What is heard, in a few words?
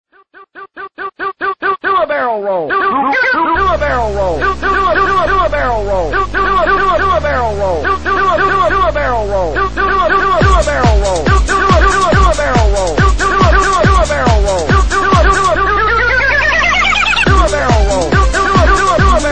do a barrel roll remix, techno